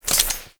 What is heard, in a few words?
Auto Mode Switch, Weapons; BE Tetra